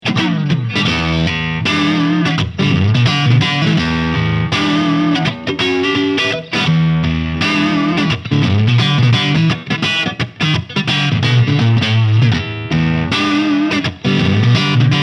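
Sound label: Crunch Single Coil, guitar amp; Harley Benton Mighty 15TH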